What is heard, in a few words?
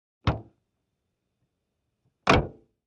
VEHICLES - AUTO ACCESSORIES: Old automobile door open and close.; accessories doors